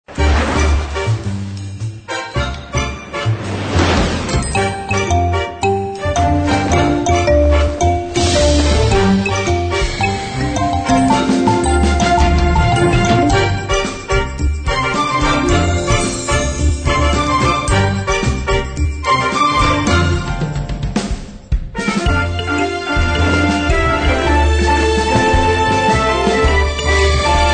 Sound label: One Happy Tune